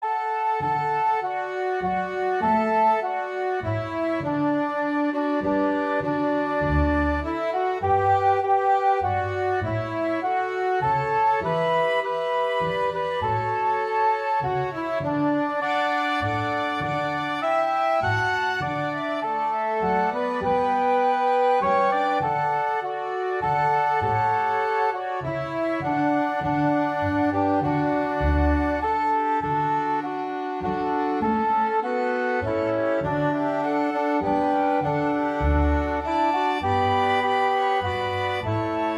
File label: Tyneside Waltz Medley